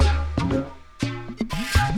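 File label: Music Dpad Base 120; from dust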